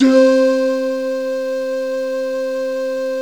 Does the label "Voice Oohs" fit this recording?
Yes